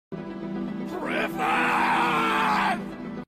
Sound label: screaming; guts; griffith